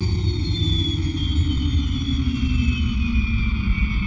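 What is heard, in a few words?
engine decelerate awing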